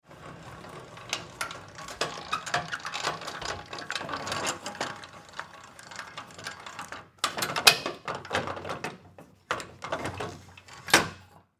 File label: Industry Truck Trailer Tongue Jack Turn Attach; Factories